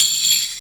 Tambourine, Vector Attacks; Ableton; Factory Packs; Retro Synths; Components

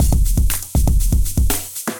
Acoustified Kit, Drums; Ableton; Bomblastic; Acoustified Kits

